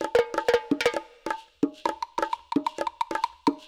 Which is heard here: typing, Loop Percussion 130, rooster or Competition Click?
Loop Percussion 130